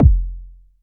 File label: big kick sound f key, Royality free kickdrum sample tuned to the F note. Loudest frequency: 136Hz; kick drum samples